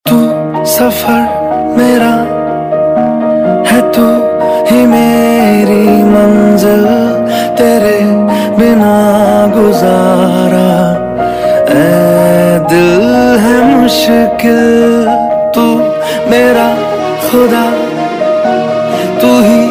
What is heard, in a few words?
Ringtone Love